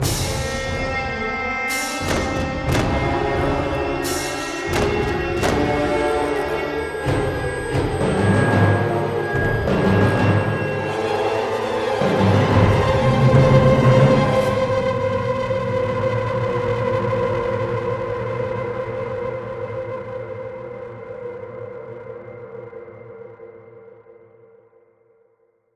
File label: Music Battle Stinger; Hunted: The Demon's Forge; x360